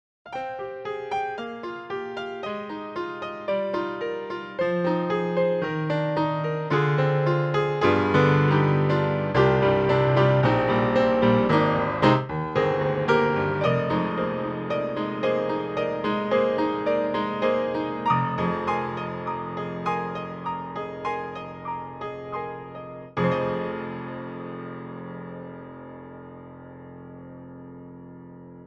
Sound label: GP m3 rt sim, piano